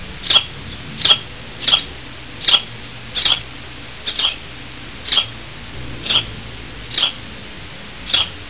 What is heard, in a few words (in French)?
moineau